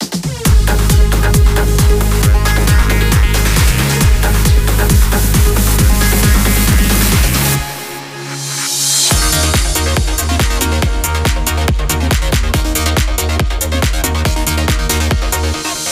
Goal 7th; Gran Turismo 6

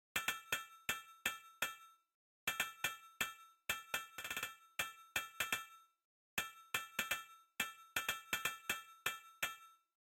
PERC; rain world

